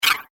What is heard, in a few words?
Laser Pistol; Rebel Princess - Laser Pistol; Laser Gun Sound Effects; science fiction sound effect